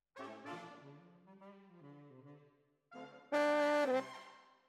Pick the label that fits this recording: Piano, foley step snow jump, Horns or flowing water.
Horns